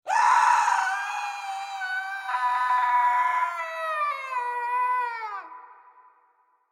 Scream Horror Blood Curdling Falling Reverb, Scream Blood Curdling Falling Agony With Reverb; Screaming; Voice; BBFX